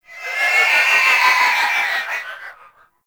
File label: Sticky Ink Vocal Call; Gameplay; Mutator; Warframe; Shadowgrapher